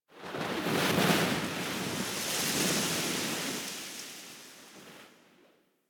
Wind Gust Large, Ambience Plains; Warframe; Eidolon Plains; One Offs

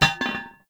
Incense Burner Contact Impact; Objects

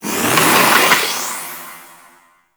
Bow Charge; Warframe; Weapons; Antler Bow; Prime Cernos

